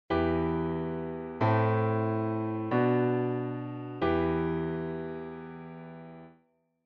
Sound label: e natural minor sequence